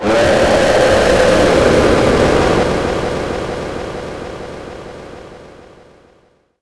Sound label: prison scream